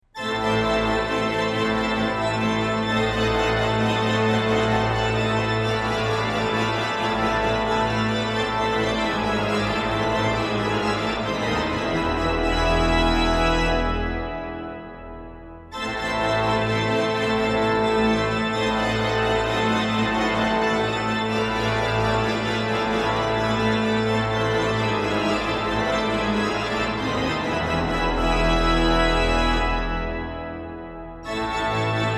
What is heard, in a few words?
Prest Appassionnato Concerto Classique, ORGUE